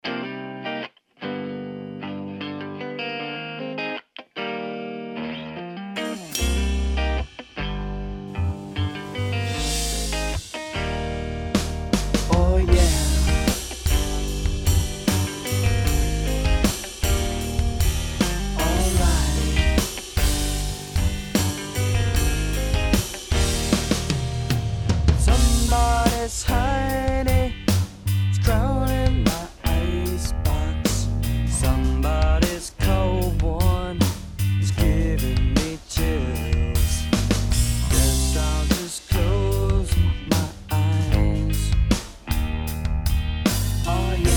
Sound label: unplug heavy; surReal